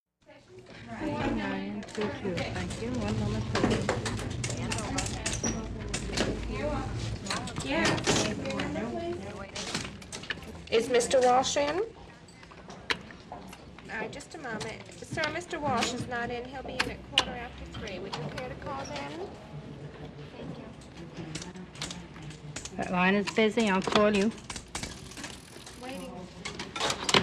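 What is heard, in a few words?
Large US Telephone Exchange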